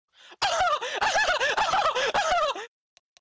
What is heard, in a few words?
laugh; torchlight